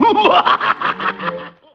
laugh satan

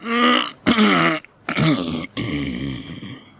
throat clearing; ooh ahh; human

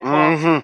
mmm hmm, voice

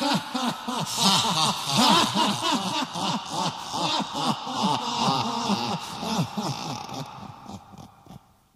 laugh demon multi; ooh ahh

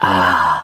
"ah", Voice

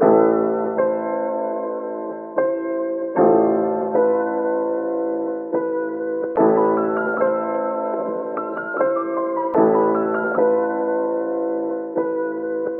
piano, Sad

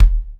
Kicks

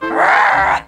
growl, Voice; ooh ahh

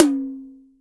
tash, Toms Electro

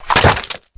bone break amputate

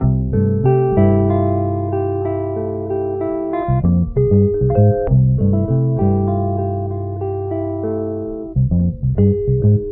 guitar and bass, Phonky Loops

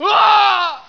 scream

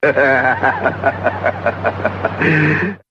laugh

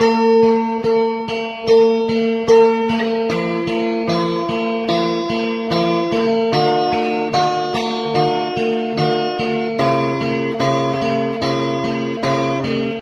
guitar, Loops, Sad

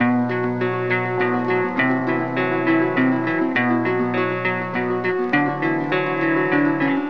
guitar, Sad

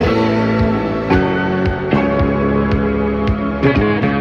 guitar, Loops, Phonky

